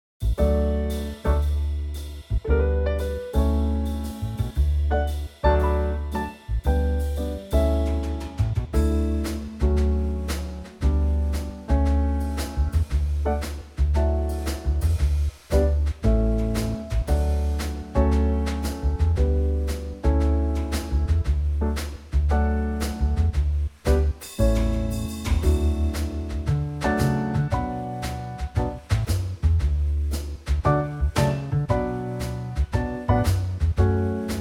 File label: D Eb Trio